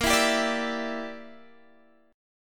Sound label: Bb 6th Flat 5th Bb; Bb6b5 strummed; x,13,14,12,15,12